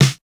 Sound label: snare, snare house